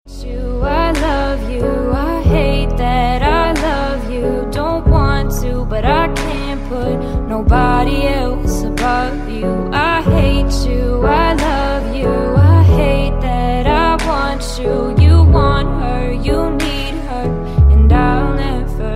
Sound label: i love u for cell phone ringtone